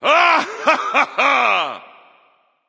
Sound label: laugh; Smite; Phoenix Ra